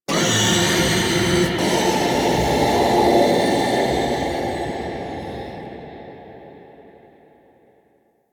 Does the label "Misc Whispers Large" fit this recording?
Yes